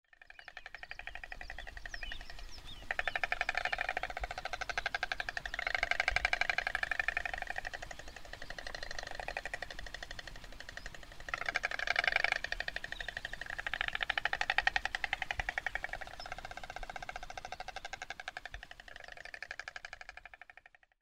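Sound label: Oriental Stork; コウノトリ目; ｸﾗｯﾀﾘﾝｸﾞ; 兵庫県豊岡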